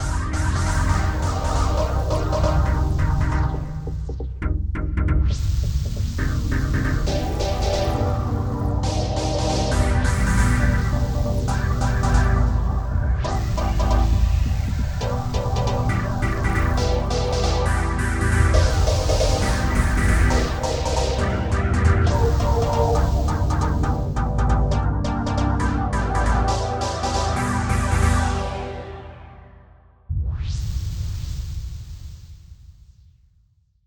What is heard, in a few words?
Sci-fi Space Intro